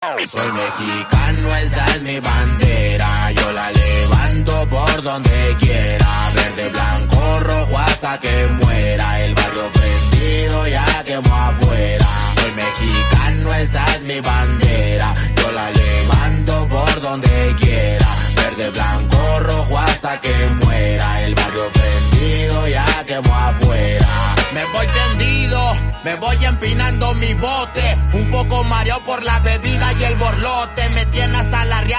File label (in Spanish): Spanish